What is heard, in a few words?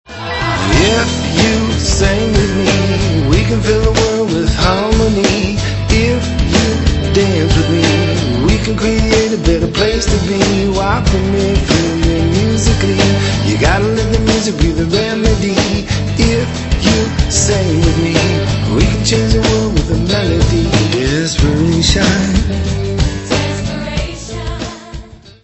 music